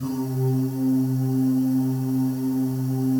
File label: PAD